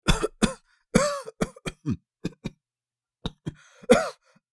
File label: Man coughing and wheezing repeatedly